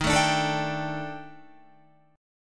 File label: Eb Major 7th Flat 5th Eb down Guitar; strummed; chord